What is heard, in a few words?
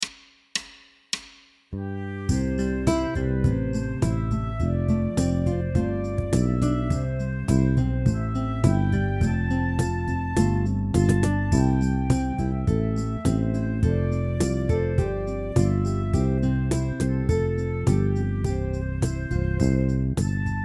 Auld lang syne fast, ukulele; podklady